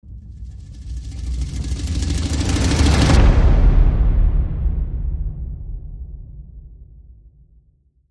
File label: Hushed Ethnic Perc Cres